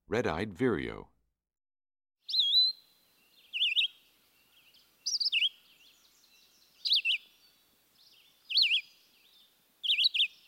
Red-eyed Vireo, Black-whiskered Vireo, Warbling Vireo, Philadelphia Vireo; Birds; Eastern-Central; Yellow-throated Vireo